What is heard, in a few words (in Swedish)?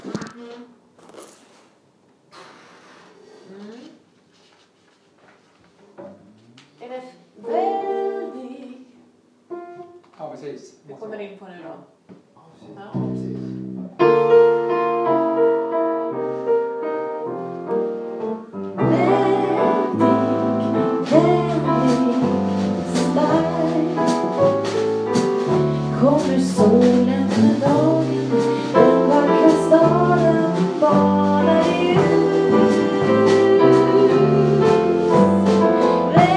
Monicas Vals